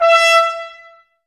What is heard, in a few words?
Woodwind Brass Ensemble; Miroslav Vitous